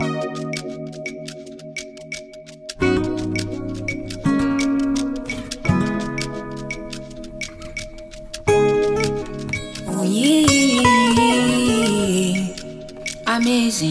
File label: Acoustic